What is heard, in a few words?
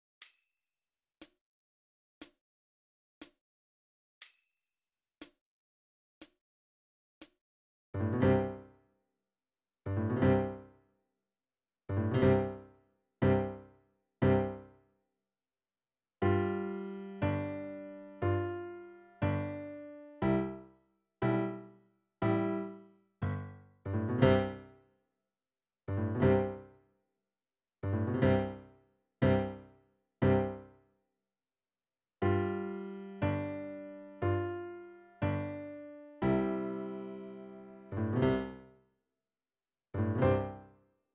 Big Bass Drums